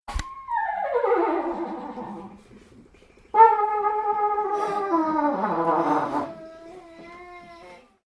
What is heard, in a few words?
trumpet